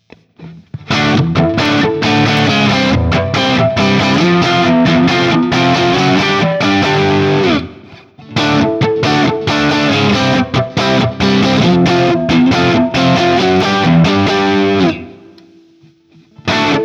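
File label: Guild Liberator Elite JCM800 Single Coil, guitar; 1988 Guild Liberator Elite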